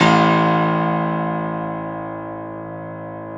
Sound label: Classical Piano ff A1; Classical Piano ff -ped; Best Service ProSamples vol.53 - Classical Prepared Pianos; Total Piano; Brilliand Classical Piano